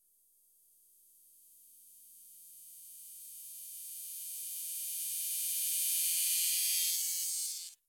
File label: Electric Buzz Rising Thin Electric Buzz; Hollywood Edge; sound designer's toolkit v2